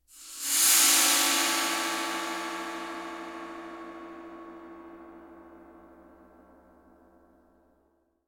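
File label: Crescendo Scraper; Brand X Music; Brand X Music Catalogue - Editor's Essentials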